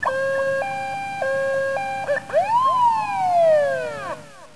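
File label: police siren; svencoop; saker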